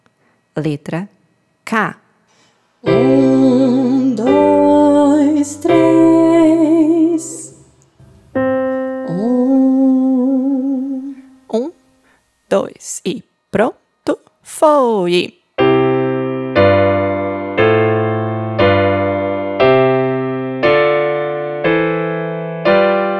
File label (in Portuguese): Solfejo Melodia Solfejo Melodico; Letra K